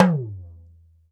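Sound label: Talking Drum down FX; E-MU Producer Series Vol. 7 – Old World Instruments; Drums&Percussion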